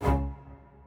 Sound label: strings